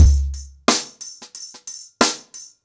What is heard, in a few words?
Nuclear 90BPM, ACOUSTIC DRUMS